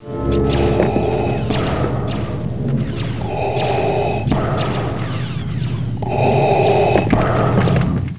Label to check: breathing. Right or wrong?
Right